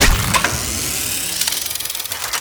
Arch Dual Pistol Charging; Warframe; Archwing

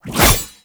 Bayonet Melee Combo; Warframe; Tenno

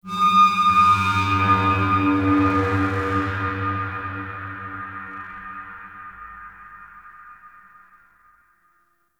Modular Texture, Ambience; Warframe; Shadowgrapher

